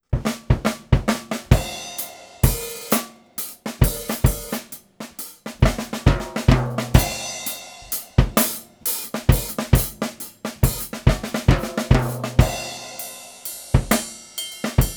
drums only; audient iD14

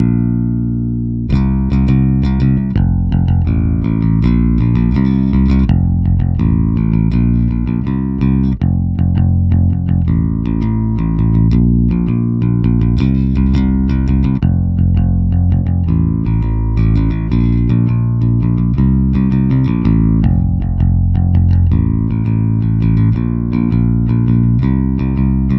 electric bass bypassed; acustica navy

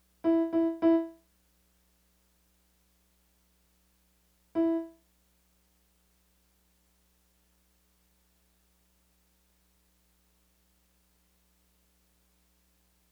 casio cdp-100 level matched

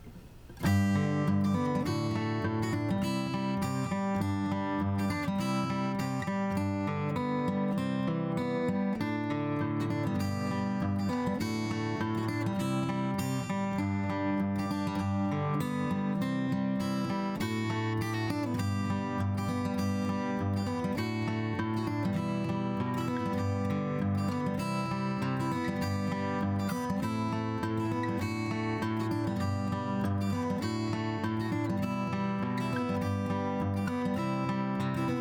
acoustic guitar compressor; slate-ik

